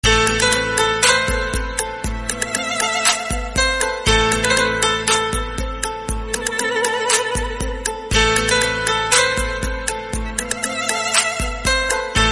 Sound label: new tune ringtone; khalnayak